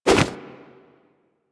Arm Swing Foley; Enemies; Warframe; Purgatory Warrior

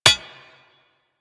Bullet Impact Armour; Warframe; Weapons

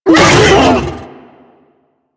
Infested Juggernaut Vocal Pain; Warframe

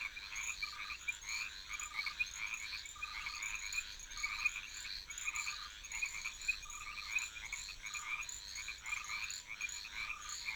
Frog Loop Night; Ambience

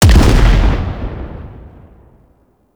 Bomber Bomb Explosion; Warframe; Eidolon; Grn Bomber

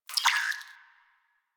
Tech One Off, Ambience; Warframe; Rail Jack

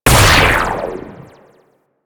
Clone Ability Hit; Amalgam Sniper; Warframe; Abilities

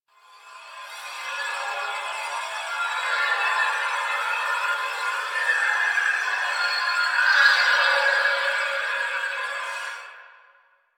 Interior Texture, Ambience; Warframe; Quests; TheNewWar; Cetus; Unum Tower

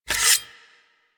Dagger Equip; Warframe; Weapons; Dual Daggers

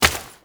Step Dirt, Footsteps

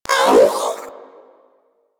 Vocal Pain; Warframe; Pets; Zanuka

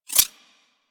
Sentient Projectile By; Warframe; Sentients; Symbilyst; Weapons

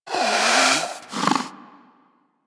Predator Snore; Warframe; Hunting Critters

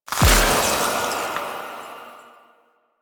Conservation Cleanse Complete; Warframe; Duviri

